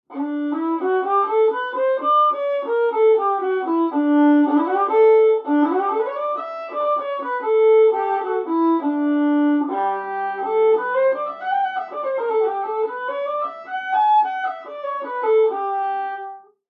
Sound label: Violin; Assassin's Creed Syndicate